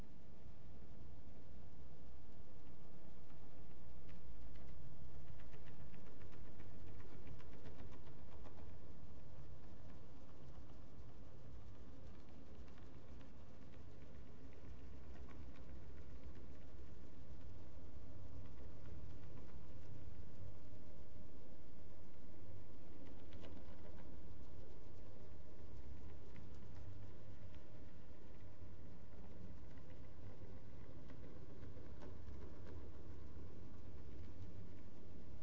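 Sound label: hydrophone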